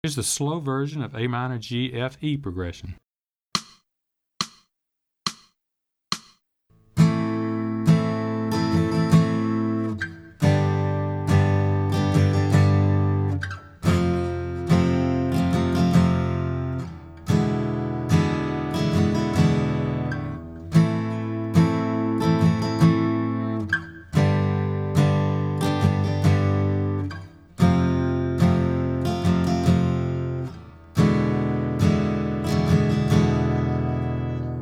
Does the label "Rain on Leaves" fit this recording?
No